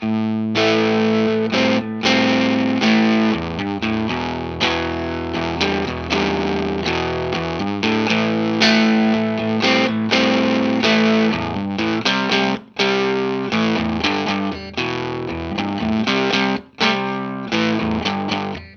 saturator blues riff; level-12oclock soak-9oclock

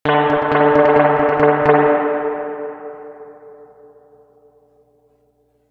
mod reverb tank 3-spring medium staccato

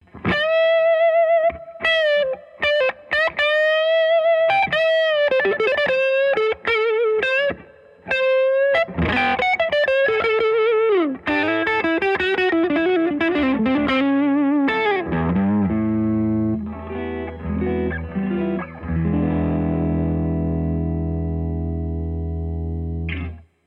mid gain lead

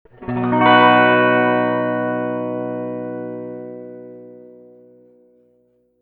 mod reverb tank 2-spring medium sustained chord

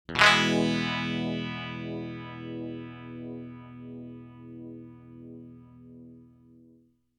strum, 12 o'clock with 2 stages

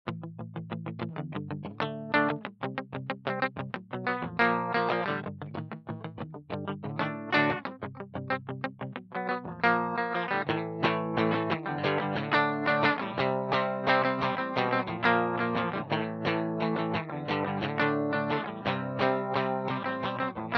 rock riff dry

